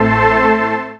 countdown

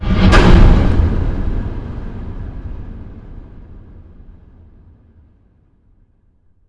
birds; pod skyrace

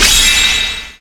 shock fire

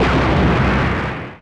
fire bang

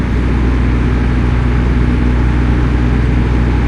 traffic mush

